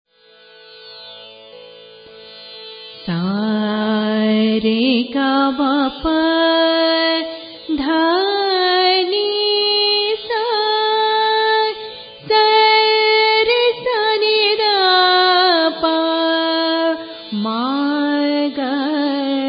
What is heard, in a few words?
bilawal, raga